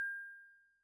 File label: Celesta, Yamaha DTXpress-II